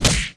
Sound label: Ball Sub Hit